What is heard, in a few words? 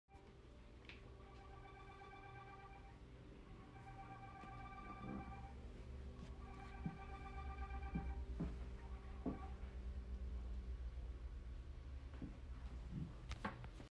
alien noise from the bathroom